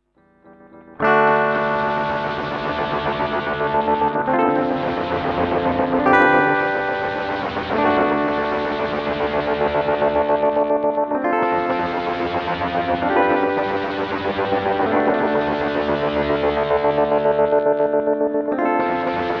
Crush

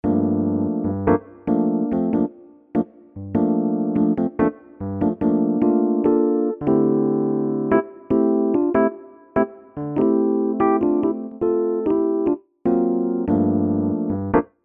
EPiano Gate Dry Wet

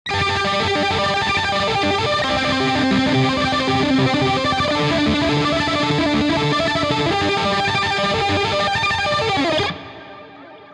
Auto Tapping; Neural DSP Archetype Rabea; Overlord

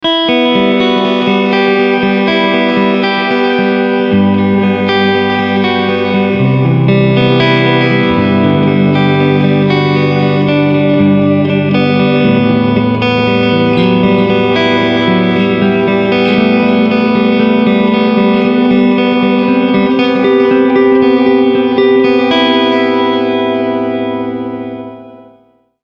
Preset Shimmer

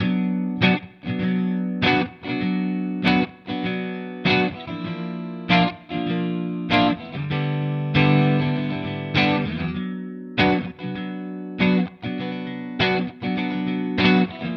Harley Benton both clean

